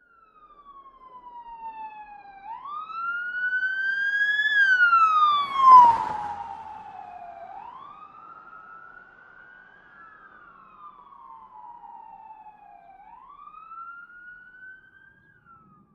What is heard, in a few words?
Police Siren: Medium Car By, with Various Types And Without Engine Noise.